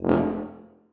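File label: tuba staccato e2; Sonatina Symphonic Orchestra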